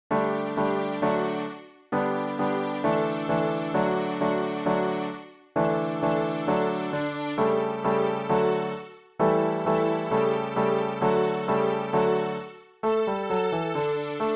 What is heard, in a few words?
String Riffs D and A; String Riffs D and A Score